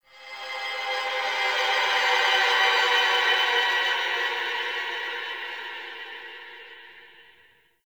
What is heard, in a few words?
Exterior Tonal Textures, Ambience; Warframe; Railjack